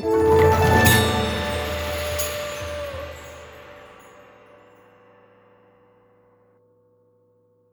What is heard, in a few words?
bonus intro; netent